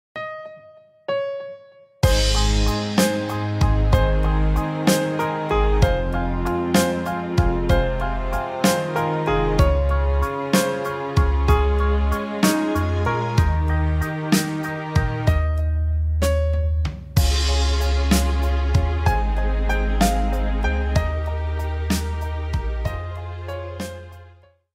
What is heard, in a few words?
perfect instrument remix ringtone